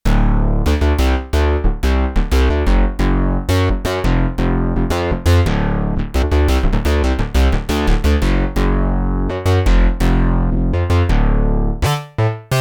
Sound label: Face Bass, Roland JD-800; Factory Patches